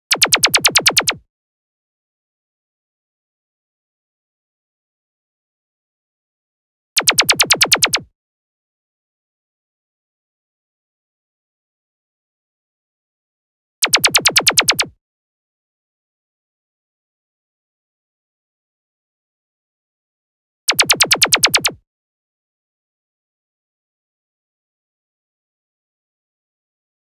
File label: Zap Synth; Europa